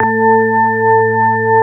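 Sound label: CX3 organ, Keyboards Old School; USB Soundscan vol.09; AKAI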